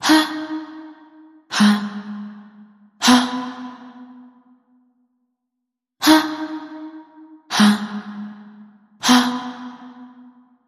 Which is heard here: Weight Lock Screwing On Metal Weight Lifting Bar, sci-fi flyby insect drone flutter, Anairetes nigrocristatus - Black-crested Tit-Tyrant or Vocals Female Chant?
Vocals Female Chant